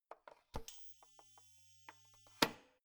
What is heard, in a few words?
oven turning on induction